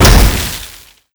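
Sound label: explosion, monster; patren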